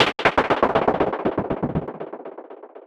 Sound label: Gated Noise 120BPM; musicradar